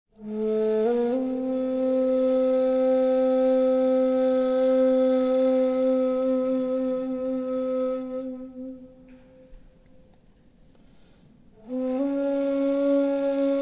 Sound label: ney bestenegar taqsim